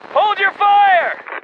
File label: friend fire